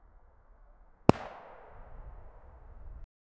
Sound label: a shot from an m4a1